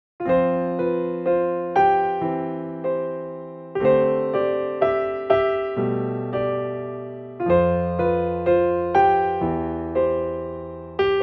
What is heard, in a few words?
cfx bright; cfx 2 bright - montage; Apps MIDI